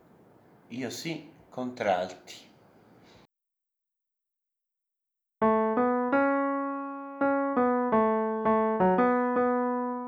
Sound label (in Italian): contralti